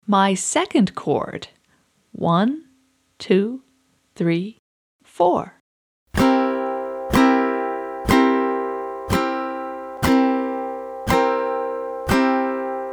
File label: My Second Chord C7